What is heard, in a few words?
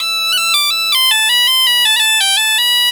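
bagpipe 160, Celtic Flavours; USB Soundscan vol.42; AKAI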